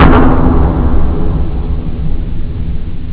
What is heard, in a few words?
Big Drum; jazz jackrabbit 2; M8